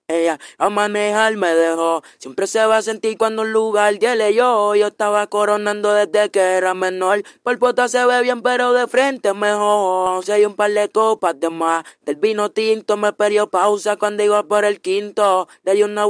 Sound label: Vocals; DIABLO ARTIFICIAL VOZ